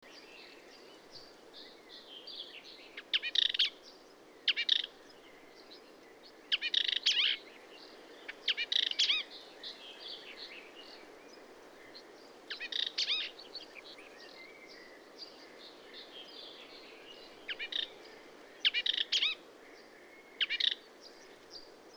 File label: Hippolais languida song; passeriformes; acrocephalidae